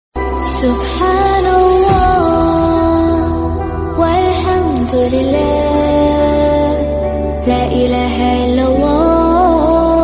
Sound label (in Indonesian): Nada Dering Pengajian Nada Dering Zikir Islamic